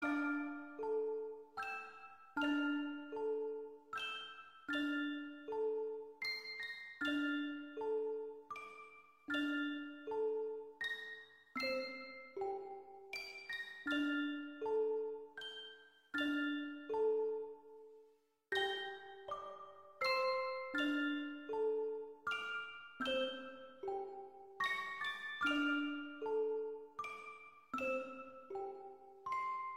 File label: Piano Song; Davy Jones